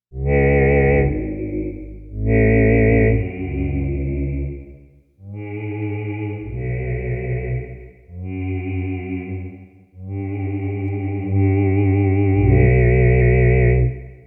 Chowning Basso Profundissimo

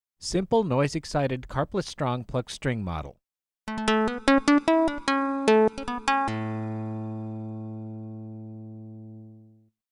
Karplus Strong Plucked String

